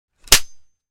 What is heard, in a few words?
Sword Clash; Hollywood Edge Martial Arts and Human Impacts